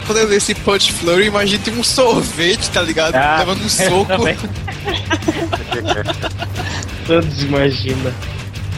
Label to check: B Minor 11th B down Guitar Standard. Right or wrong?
Wrong